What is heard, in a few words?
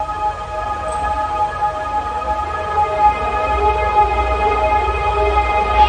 long ambience, pads